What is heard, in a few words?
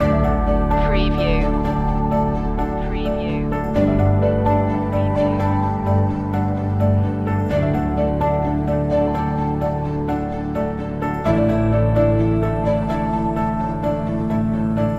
how far can we go loop